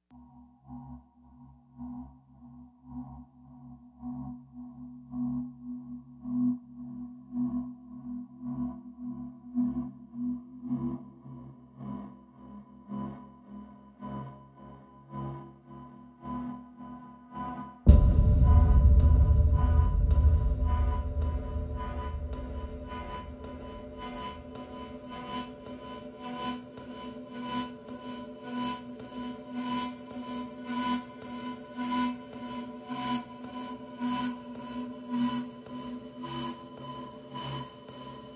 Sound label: Synths And Perc; Ninja Tracks